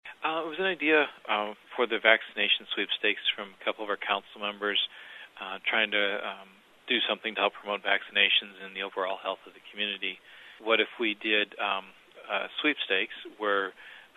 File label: SWEEP